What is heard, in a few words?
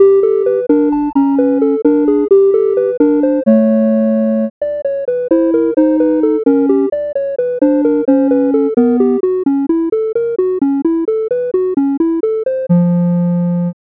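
Tuning Systems CHROMATIC; jmtutorial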